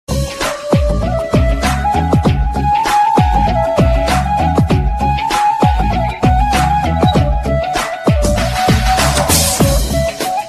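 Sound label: bansuri with bass ringtone, bollywood ringtones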